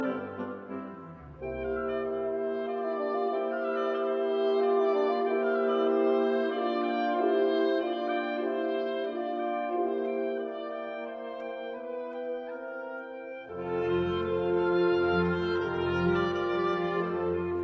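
Serenade in D minor, Minuetto Tempo di minuetto, wind ensemble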